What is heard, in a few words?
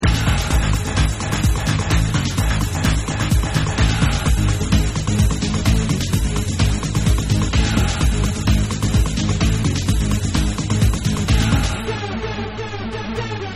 Techno Mix